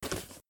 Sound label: Cardboard